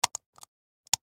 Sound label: Food Walnut Crack Open; Food Sound Effects; Household; Foley